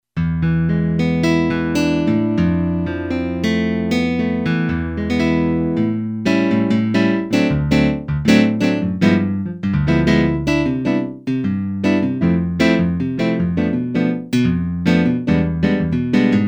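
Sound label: Acoustic Guitar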